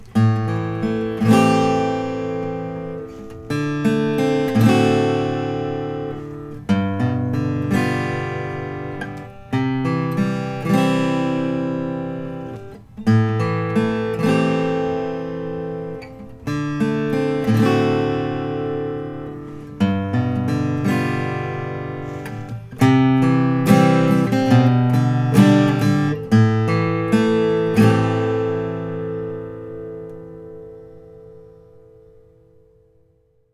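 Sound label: bourgeois 0 classic short scale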